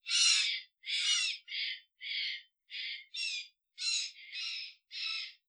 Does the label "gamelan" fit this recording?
No